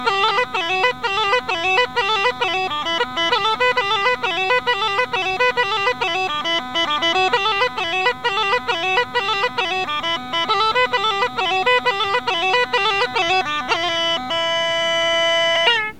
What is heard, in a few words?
sitniji, Rodope Gajada